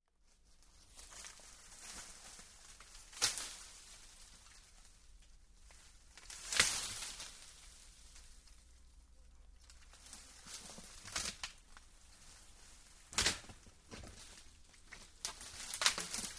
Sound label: Footsteps Eerie Wal